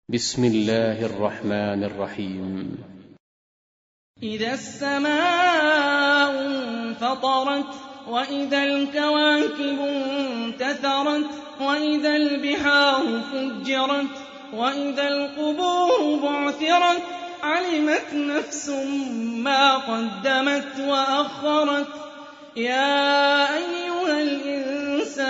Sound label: Quran; Hani Refaey